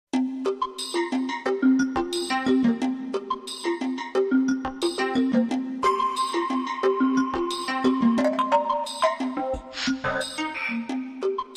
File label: Disjointed Machine Like Positive